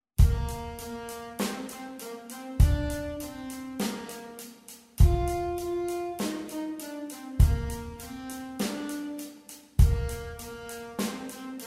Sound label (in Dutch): Rock Altsax